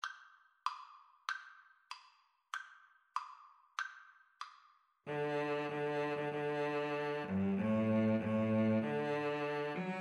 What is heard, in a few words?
joy to the world, Viola-Cello Duet